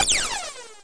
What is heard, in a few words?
Shot; Ready Set Go Sprout!